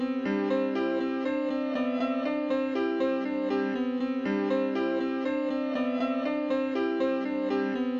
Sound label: Piano Arrangement